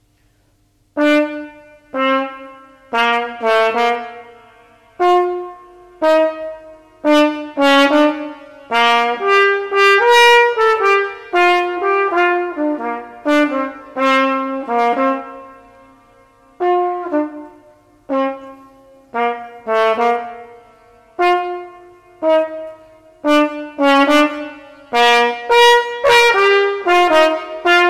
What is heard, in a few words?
Tennessee Rag Trumpet NO PIANO; Grade 2